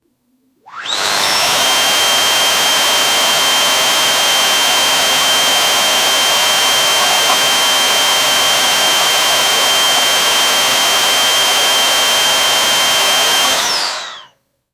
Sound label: vacuum cleaner sound